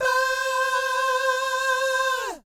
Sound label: VIB FX, Vocal Planet Jazz & FX; ILIO; JAZZCOMBO